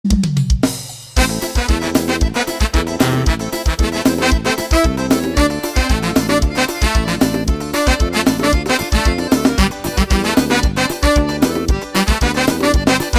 forro ao vivo; eco park tamandua

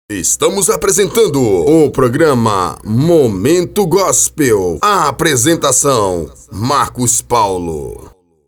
gospel

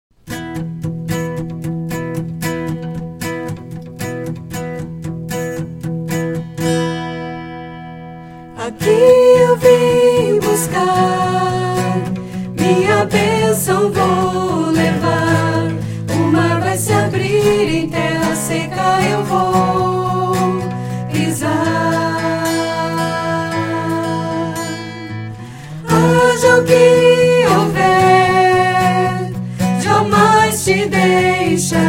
voz e violao

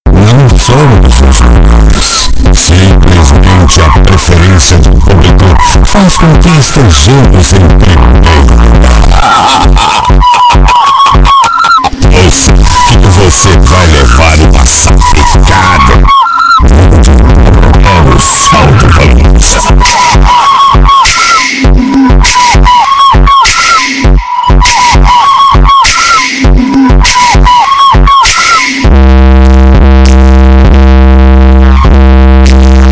som automotivo graves

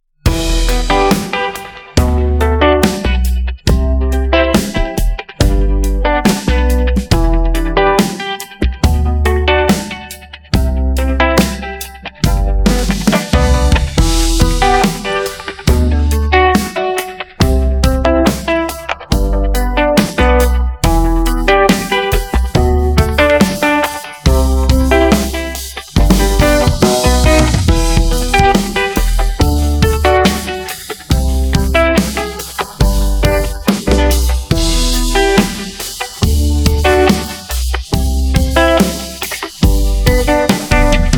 acustico instrumental

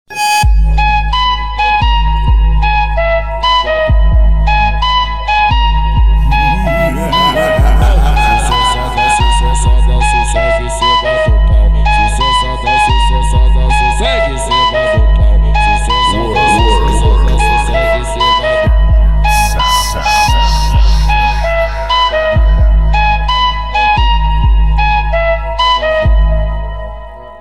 Flauta Remix Funk Bass

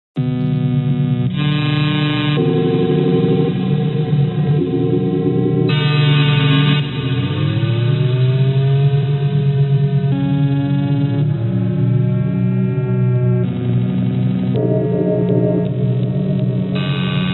fundo musical suspense terror horror; themes musicas de terror soundtrack

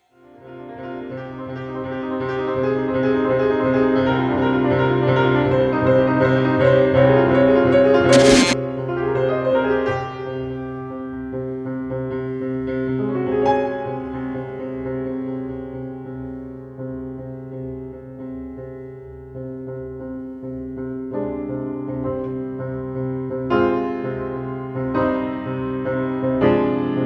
vinheta; penhasco

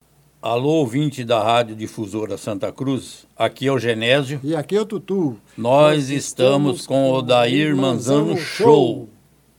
vinheta

